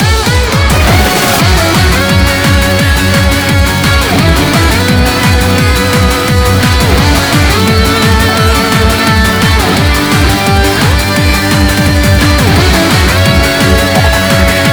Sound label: Quartz Activate Chance BGM; pachislot eureka seven ao